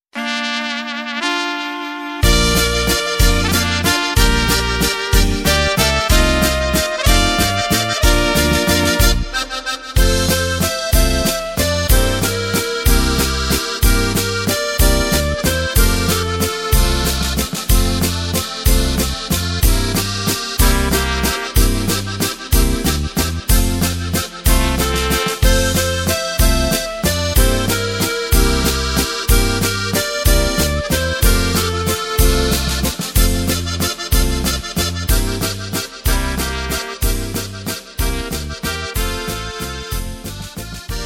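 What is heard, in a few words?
Katharin Drums Playback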